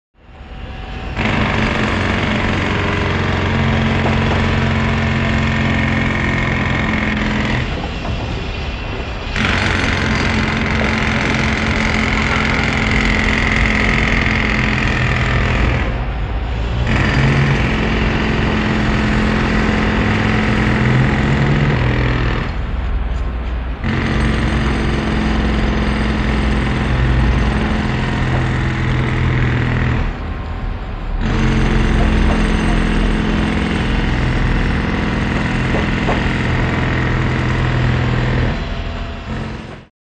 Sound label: Construction Site Atmosphere; Building Site Atmosphere. Pneumatic Drill And Background Machinery.